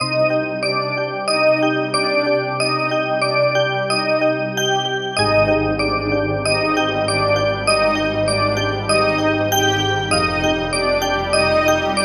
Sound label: org bell pad